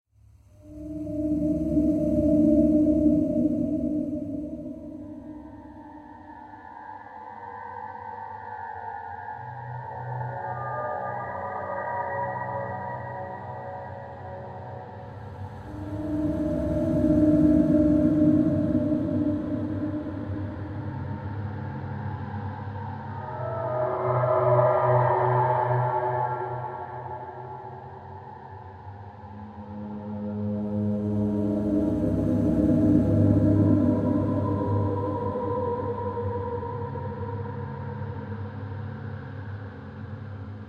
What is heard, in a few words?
dark water; minecraft; Votive Content